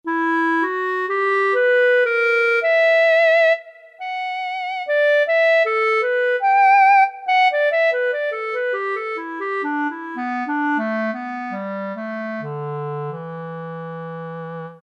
CLARINET; Roland SN-U110-06

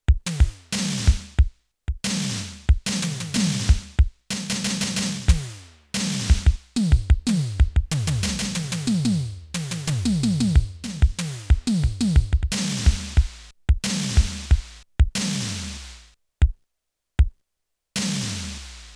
KIT TWO, Electronic Drums, Yamaha TX16W

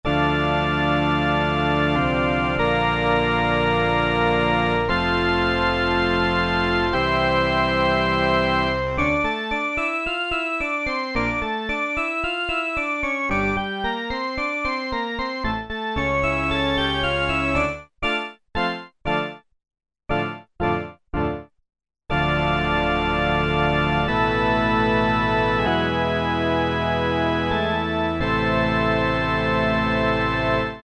Full Organ, Korg Poly-800

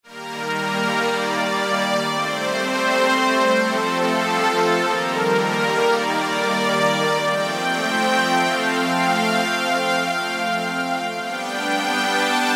ARP OMNI; Kurzweil K2000 Best of Analog

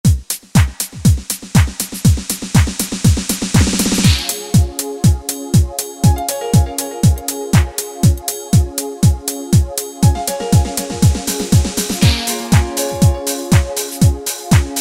Romantic, Yamaha DJX Styles

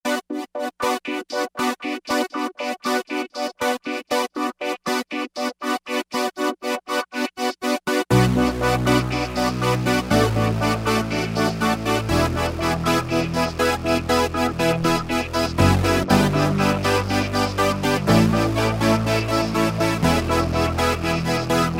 V-Analog; Factory Patches

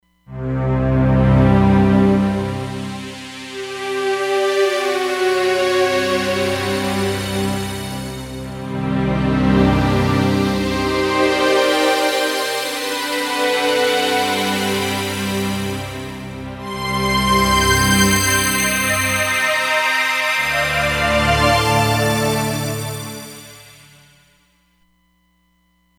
Movie Scene, Roland Fantom XR

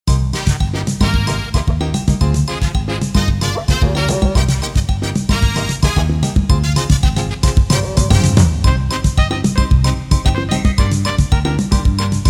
Casio CTK-601, Rhythms, Samba

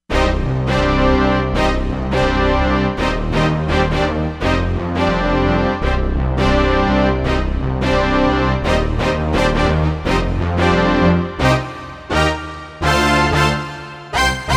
Brass Ens, Korg MSC-07S Synth; Korg MSC-07S Synth 2

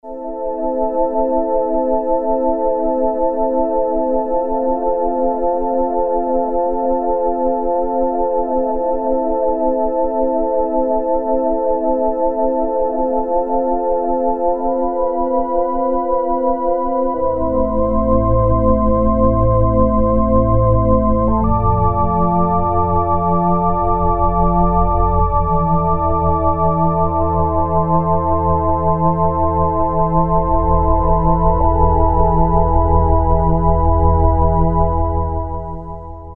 Ethereal, Roland Juno-106; Factory Preset

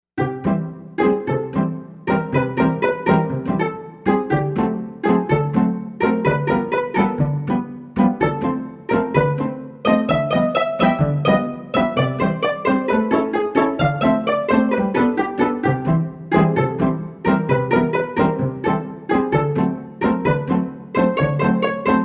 Pizzicato, Korg M1; Factory Patches

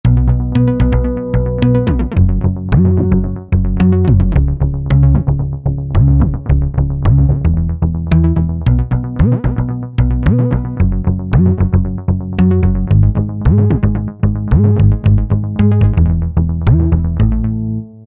Mode Bass, Roland SR-JV80-19 House Collection

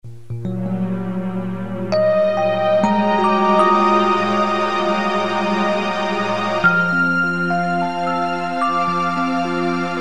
Strings

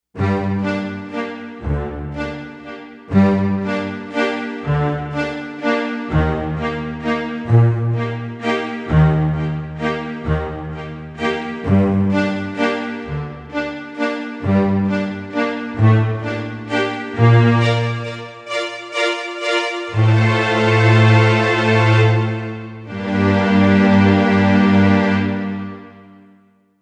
Stringorch, Korg M1Rex; Factory Patches

